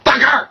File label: YELLING; amnesia: the dark descent; madness archive; madness-ch03-demo; enemy; hater